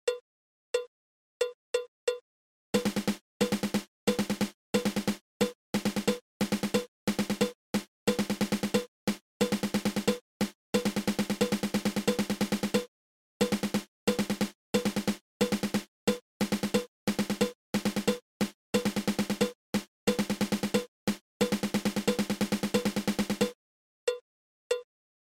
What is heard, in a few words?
Triple Stroke Roll